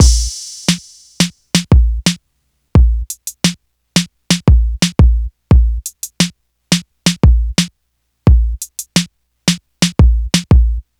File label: 87 bpm Breakbeat A# Key, Free breakbeat sample - kick tuned to the A# note. Loudest frequency: 1396Hz